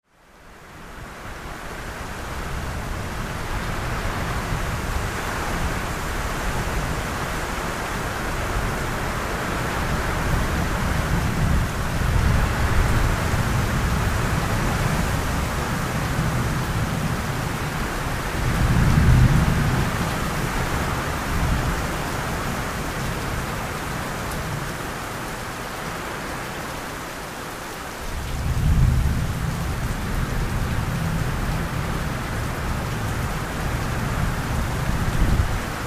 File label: thunderstorm